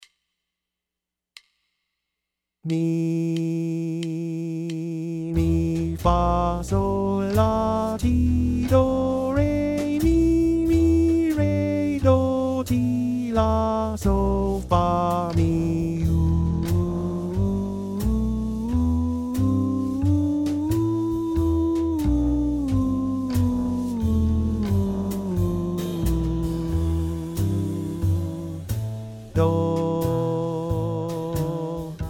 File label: E Phrygian 90 C